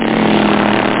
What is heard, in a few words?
3000GT